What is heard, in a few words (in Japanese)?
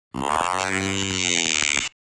「ライオネックスの叫び」